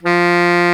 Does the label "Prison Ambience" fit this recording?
No